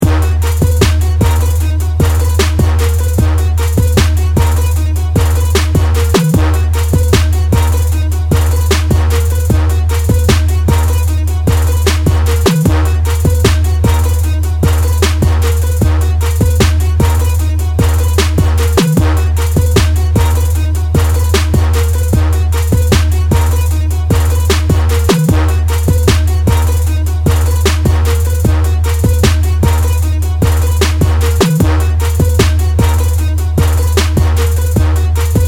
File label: instrumental